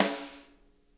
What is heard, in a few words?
snare rim, drum kits; Sonic Reality; Interactive Drum Kits